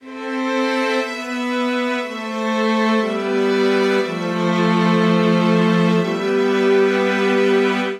Phrase Blooming A Minor 120 bpm, String Quartet; MIDI Clips; Ableton; Spitfire Audio

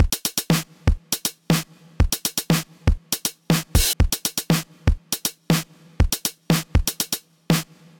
DMX 120 Beat, Drum Machines; Tightdope; Ableton

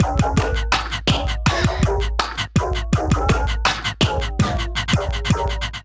Suffocation Kit 82 bpm, Percussion, Voice Box; Ableton; Factory Packs

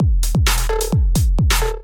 Kit 130 bpm; MIDI Clips; Drums; Flutter Kit; Ableton; Factory Packs; Skitter and Step

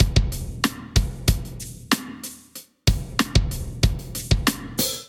Kit groove 94 bpm; MIDI Clips; Timbuktu; Ableton; Drum Essentials

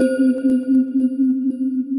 Tip Toes, Mallets

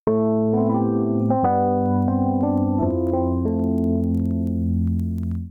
Dirty Back Chords A minor 92 bpm; MIDI Clips; Accompaniment; Ableton; Chop and Swing

